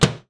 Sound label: Microwave Door, household rhythm samples Hits